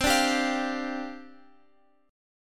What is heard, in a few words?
C Suspended 2nd Suspended 4th C Guitar Open F; Csus2sus4 strummed; x,x,12,9,10,12